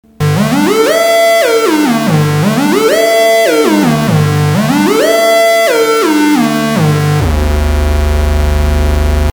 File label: Moog; pogomoog